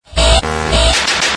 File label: player lost a life